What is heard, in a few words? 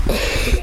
cough